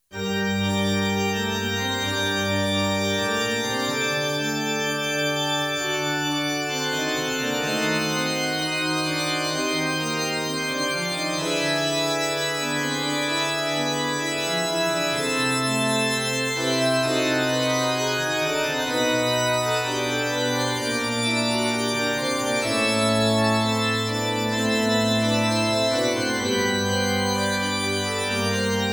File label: Midi Great Light Fl Ob Eh Fg CO QN 64